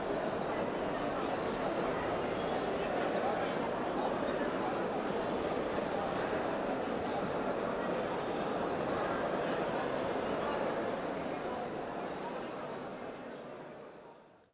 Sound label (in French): foule